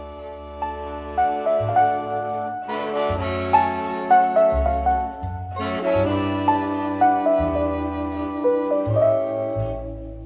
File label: piano horns slow twenties, loops, phonky